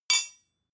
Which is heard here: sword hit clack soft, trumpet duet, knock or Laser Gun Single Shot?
sword hit clack soft